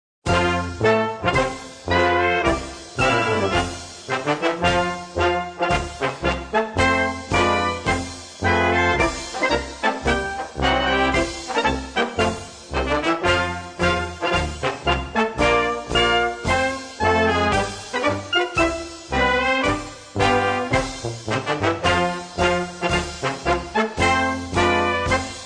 Bergsteiger Marsch; Bozner

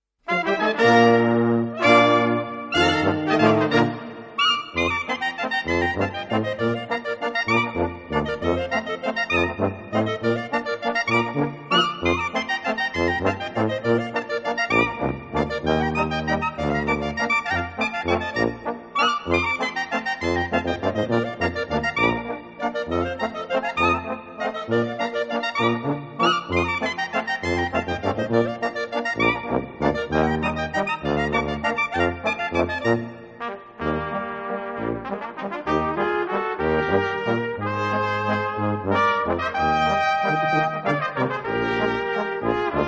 Landlertour, Kapelle

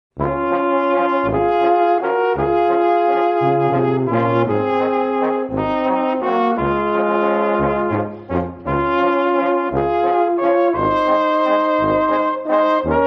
Walzer, Kapelle; Klattauer-Walzer

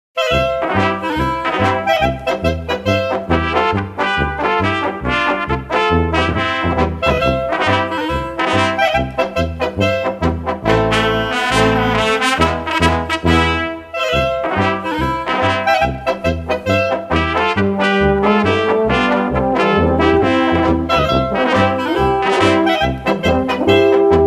Polka; Banzauner-Polka

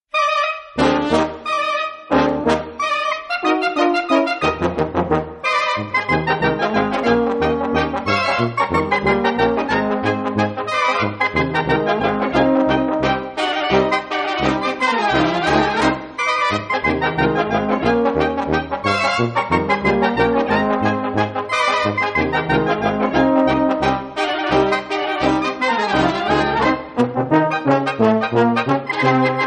Galopp, Kapelle; Crambambuli-Galopp

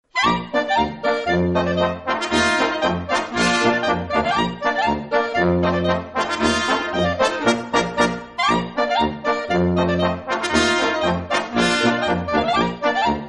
Engl Schottisch, Kapelle

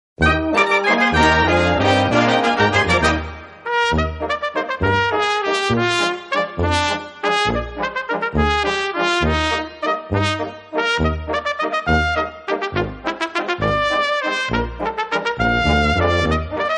Trompetenlandler, Kapelle; Wolfratshausner

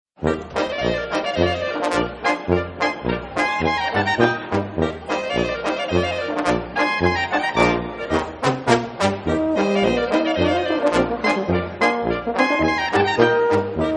Schottisch, Kapelle; Draxlrieder-Schottisch

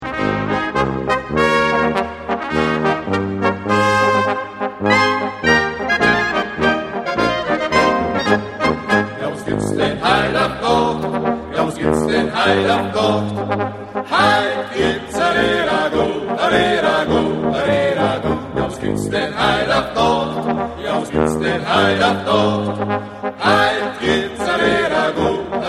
Klingelton; Rehragout

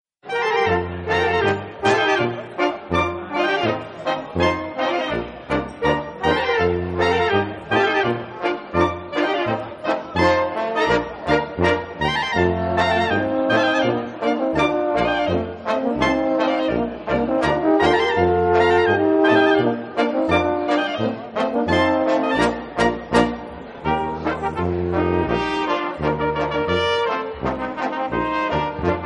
Boarischer, Kapelle; Baiadam-Boarischer

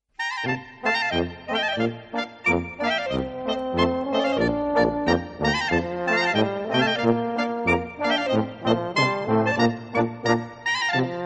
Polka, Kapelle; Saulocker-Polka

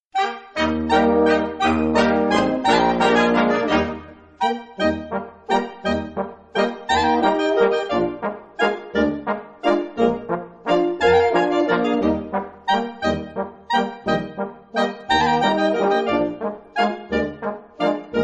Kuckuckswalzer, Kapelle

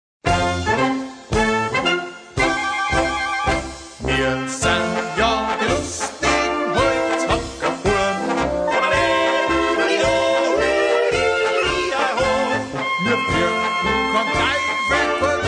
Tiroler Holzhacker Marsch

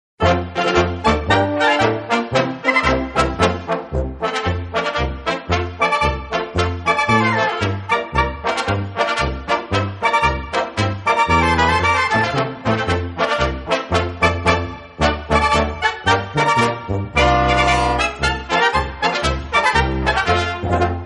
Marsch, Kapelle; König-Ludwig-Marsch

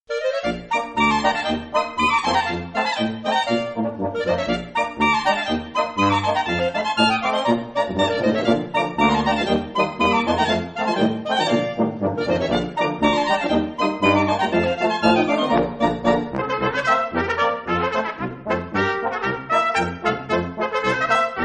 Schottisch, Kapelle; Vom Mane

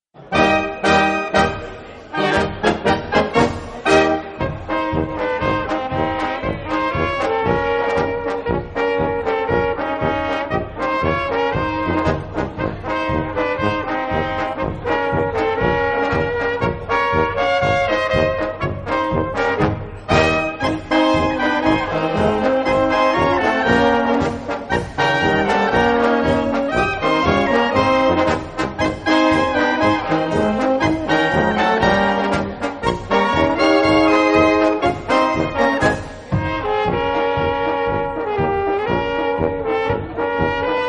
Christlpolka, Kapelle

